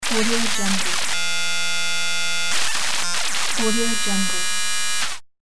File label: crazy computer glitch